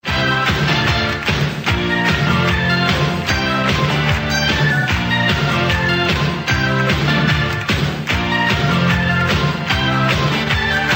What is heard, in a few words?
Aerobic